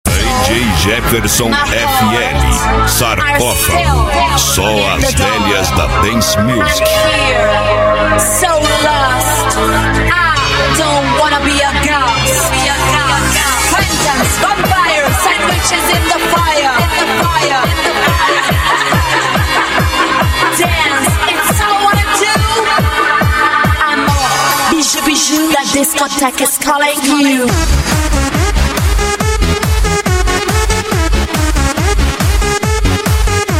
dance musik

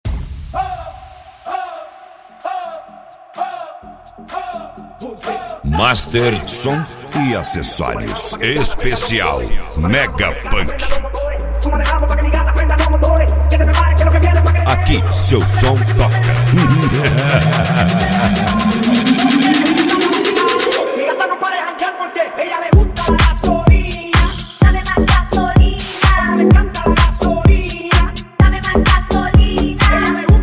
mega funk; master som e acessorios

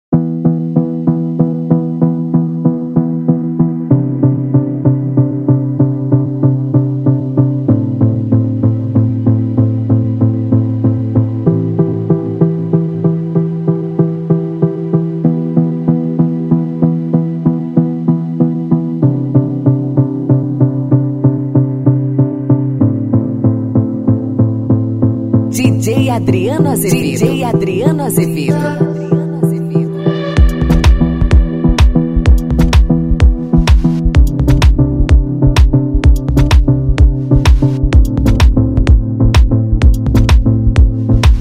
progressive eletronic music

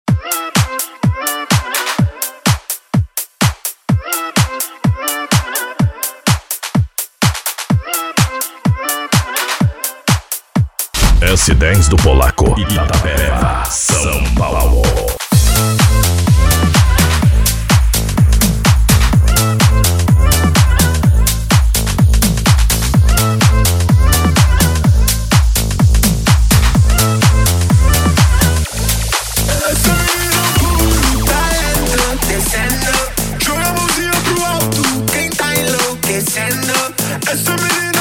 electro funk

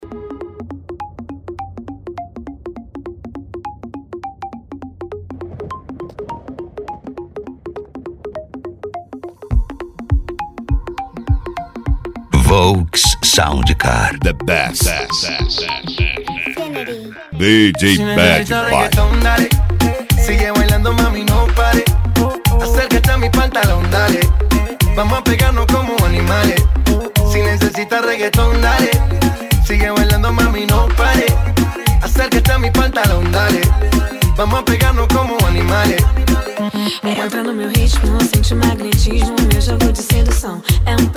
sound car reggaeton; cd volks sound car

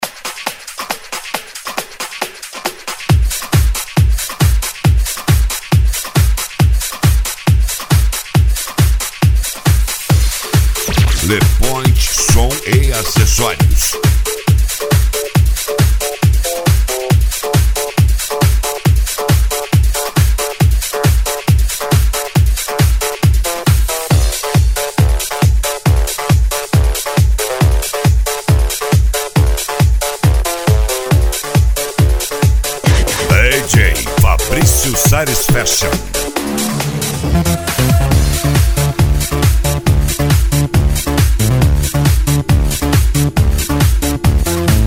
deep house

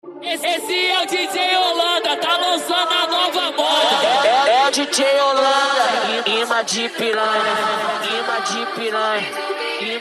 mega funk; mini cd mega funk

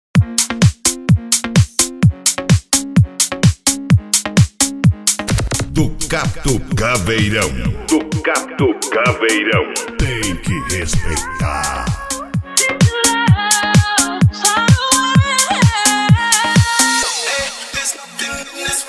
especial mega funk

